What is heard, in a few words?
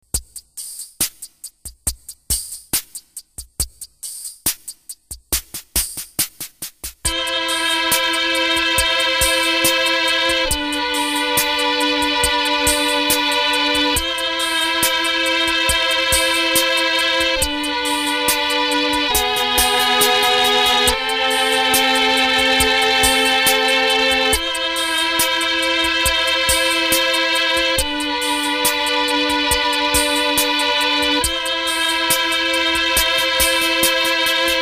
violins